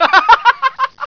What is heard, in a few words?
LAUGH; MCRAFT